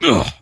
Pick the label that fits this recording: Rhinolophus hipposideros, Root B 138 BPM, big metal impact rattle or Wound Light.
Wound Light